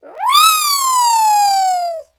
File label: Creature; Orphaned